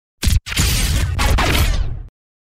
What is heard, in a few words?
Backlash, DJ sounds